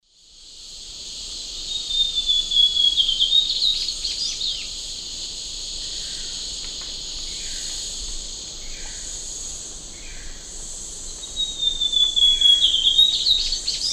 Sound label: Cyanocompsa cyanoides, song; S. America; Eastern Lowlands